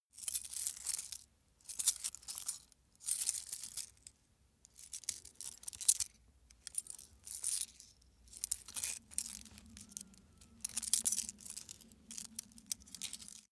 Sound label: small metal handling jingle long